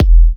E kicks; markus hakala kicks

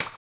HAMBONE; Blues & Soul

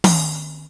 drum, EMU Proteus; Proteus1

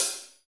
CYM 14, Real HiHats, 14 Hi Hat

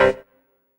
HOUSE, House Dance; 90 s Sample CDs; Best Service Dance Mega

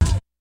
kicks snare, kid; Kid Nepro; Elite; KICKS SNARES

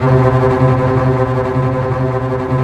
TREMOLO C3, TREM STRINGS; Greytsounds VOL-1

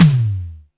HEX LOW, TOMS; Back In Time Records; Big Bang

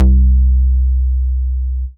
C Bass

